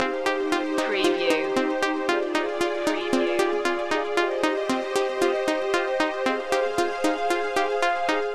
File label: alarming news loop